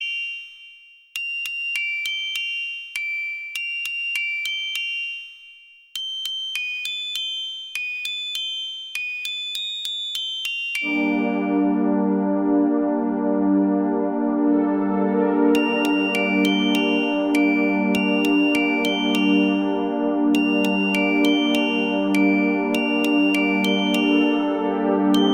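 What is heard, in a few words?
christmas ringtone; ringtones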